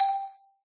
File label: brick combo; candy crush saga online